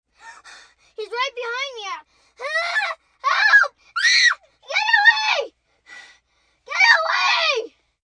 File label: Fear Get Away, Scared/Fear, 1 child, 7 yrs., screaming, yelling, being chased, human voice; kid